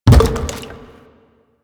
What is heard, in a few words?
Gas Tank Impact; Warframe; Nineteeen Ninety Nine; PvPvE